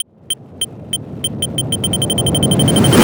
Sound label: satchel charge; LoL; ziggs poolparty